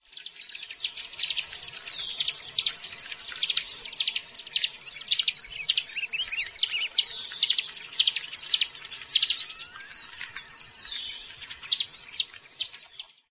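calls of two birds, White-eared Hummingbird, Mexico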